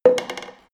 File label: plastic fall